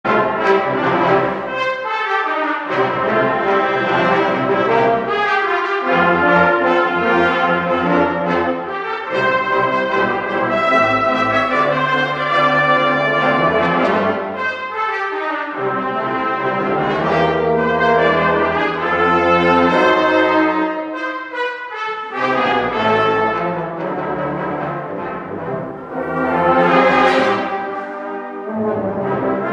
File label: brass